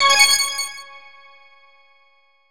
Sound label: Silver Coin; Diddy Kong Racing HD; N64